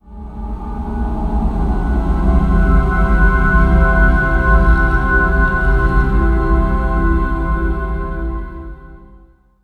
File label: Zombie Round End; Zombie Revelations